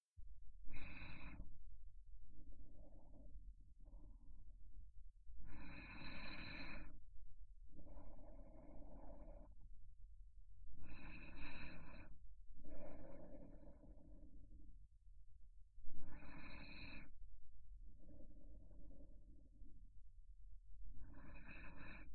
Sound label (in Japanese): 寝息のみ; これからもよろしくお願いします